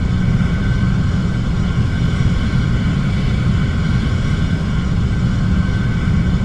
jet cockpit l, engines; War Thunder

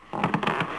Boat Creak; Civ4 warlords

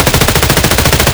mpx outdoor close loop; Escape from Tarkov

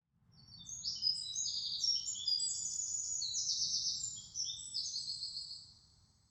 europe birds; ambient; War Thunder

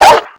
dog pain

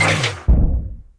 Aircrafts Gear End; DCS

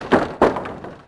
Oars Wood; Civ4 warlords

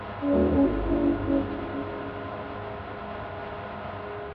ambient port; World Of Warships; port3d

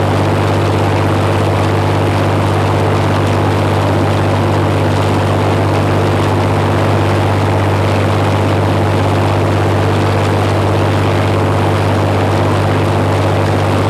plane; World of Tanks

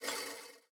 spinner wheel spin accent; Rust

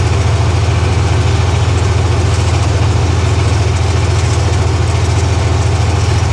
Marder engine idle; DCS

